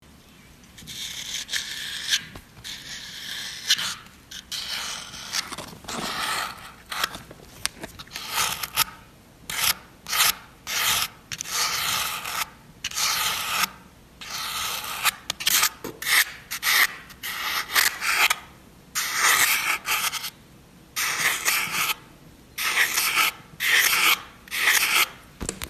Foam

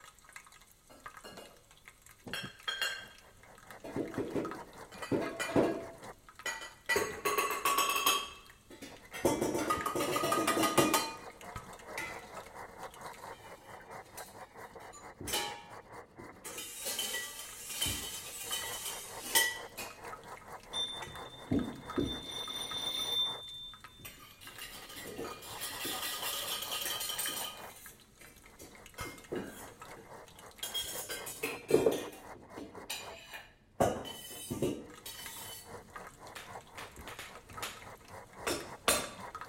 Playing with beats

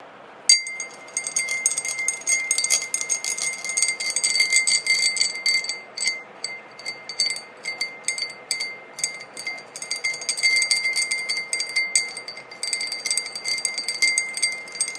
Glass

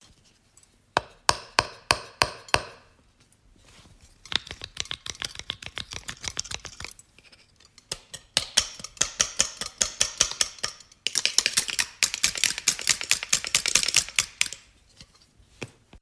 flower clipper

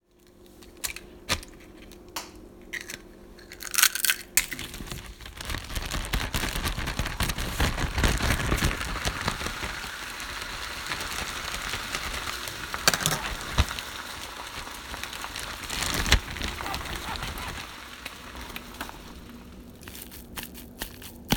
egg symphony

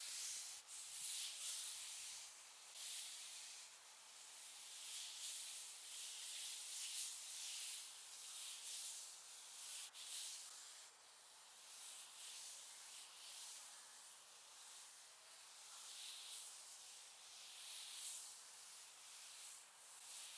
marble

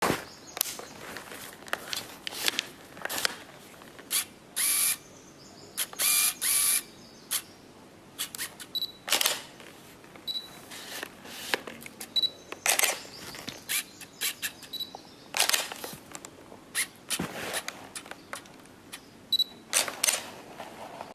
Camera

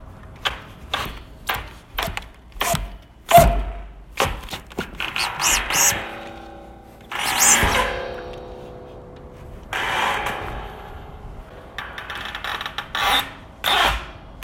window

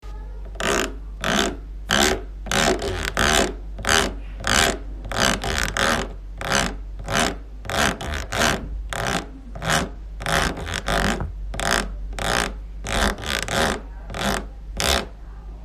Comb sound

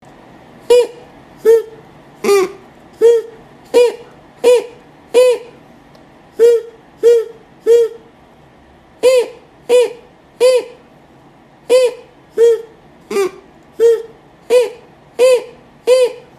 Paper flute playin That lamb song

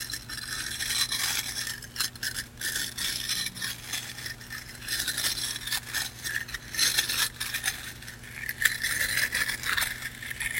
Hair Brush

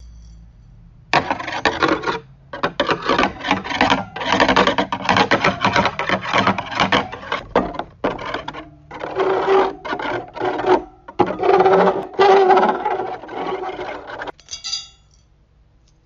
Hair Massager

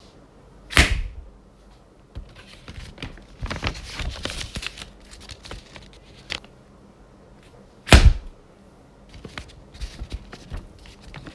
Paper Popper

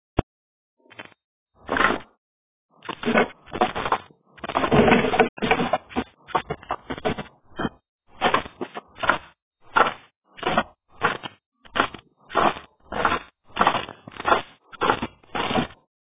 Lamp Shade

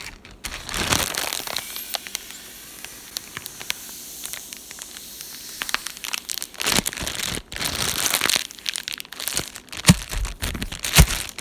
Ziploc

